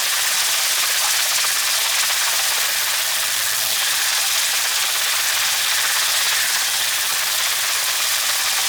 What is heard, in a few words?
Rod Electric Loop; Ambience; Warframe; Corpus Gas City; Gameplay Remaster